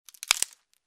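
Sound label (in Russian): наступили на ветку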